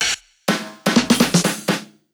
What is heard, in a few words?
Drum Fill 125 BPM